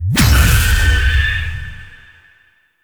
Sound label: Reverie Impact; Warframe; Wisp